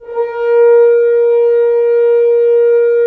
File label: Horns; Roland - LA Composer vol. 4